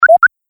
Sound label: Collect Item Pick Up Sound, video game sound effects